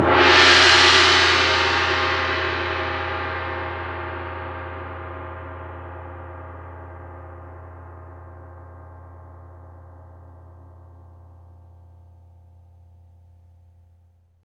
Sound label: CYM LRG; Roland - LA Composer vol. 1; STANDARD PRC